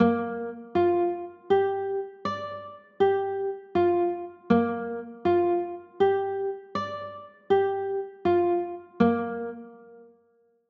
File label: b flat 9, chords